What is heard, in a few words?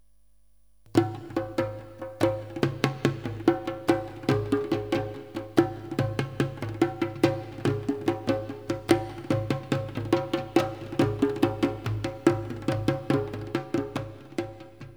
dnb